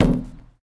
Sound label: Street Ball Wood Back; SFX Kit; Street Basketball